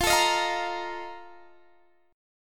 F 7th Add 11th F; F7add11 strummed

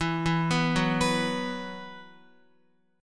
E Suspended 2nd, Guitar; x,7,4,4,6,7; 4F,4A,5C,5G,5C,6E; arpeggiated; JGuitar

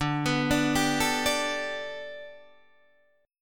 G Major Add 9th Guitar Standard, chord; Gadd9/D arpeggiated; JGuitar

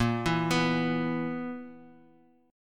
B Major 7th Suspended 4th Bb

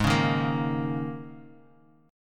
G sharp Suspended 2nd Suspended 4th; strummed; 16,16,13,15

